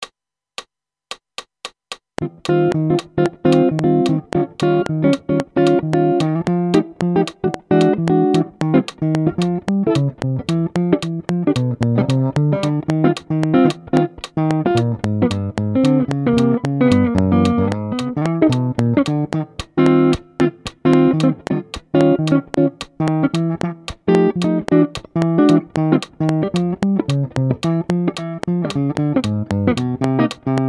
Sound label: Guitar